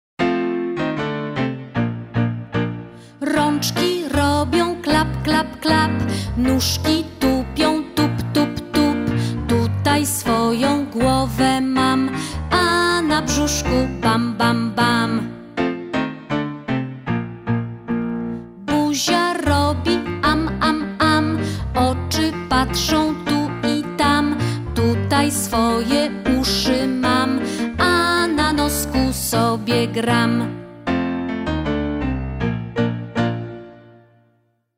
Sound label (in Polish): raczki robia klap klap klap